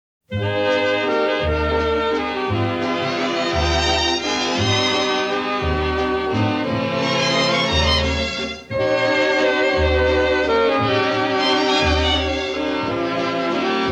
waltz

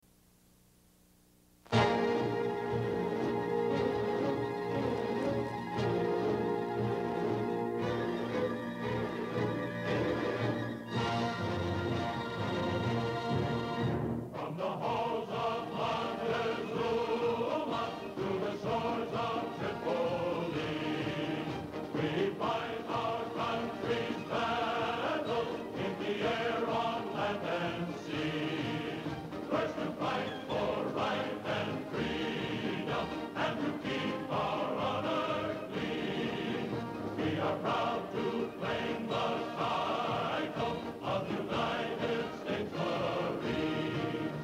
marine hymn

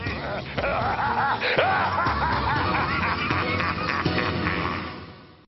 laugh; Mandarin

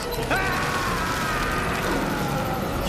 yell; Dormammu

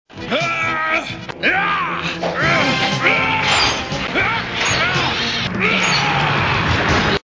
yelling; Wolverine

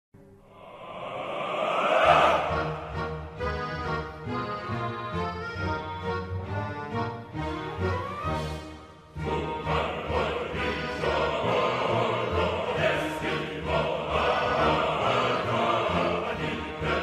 marching song; Zangief

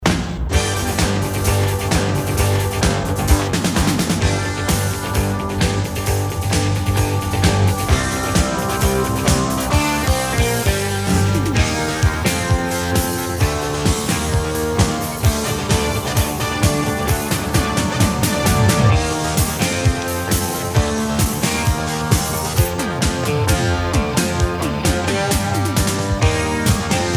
instrumental

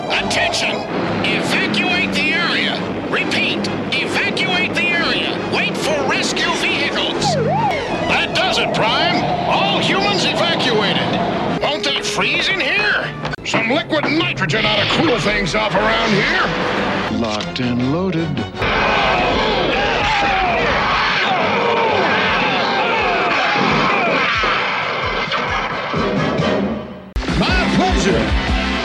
groove

